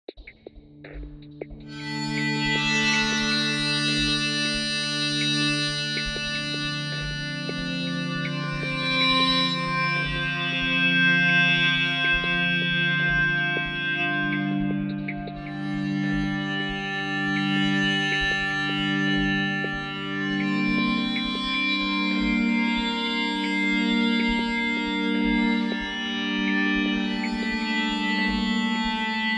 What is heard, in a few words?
psaltery